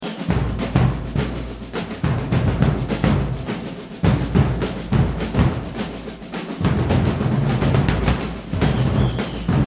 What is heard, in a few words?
surdo; we love